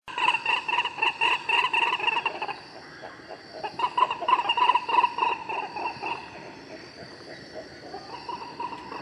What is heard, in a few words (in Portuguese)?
coró coró